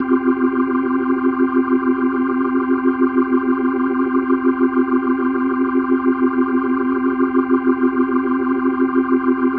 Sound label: Organ C Sus, Hip-Hop Organ Licks